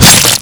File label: melee katana; l4d2; talos axe; weapons